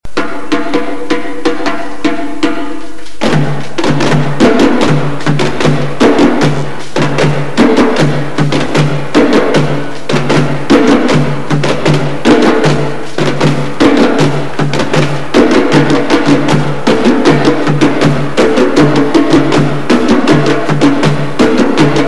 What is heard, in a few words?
kpanlogo